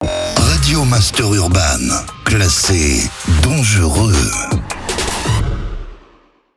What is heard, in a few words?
JINGLE RADIO; RADIO MASTER URBAN; Habillage